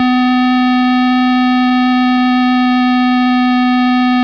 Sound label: TONE; XLIT016; COMPUTER